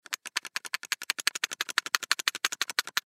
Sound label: Chalk Box Shake Long; Playgrounds; Foley; Blastwave